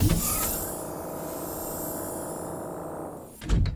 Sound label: intdoor, toyota